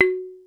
mallets

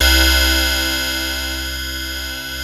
PPG PLUCK C2, analog; USB Soundscan vol.49; Analog Fantasies; AKAI

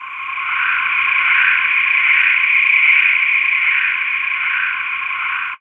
Pad; Dream Zone

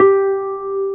harp G3; AKAI S-Series CD-ROM Sound Library VOL-8

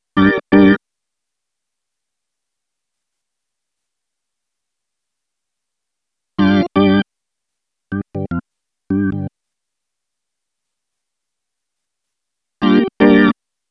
Organs, electro lounge; Sonic Foundry (Sony Creative Software); 077 Jive Woman

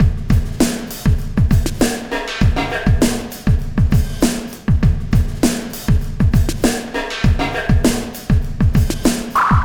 Sky Lab Beat, Processed Drumkits

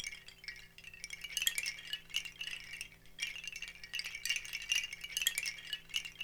BAMBOO, Percussion; ILIO; Synclavier Percussion 2; World & Orchestral

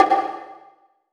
Big Conga

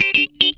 Funk Guitar; 90 s Sample CDs; Zero G

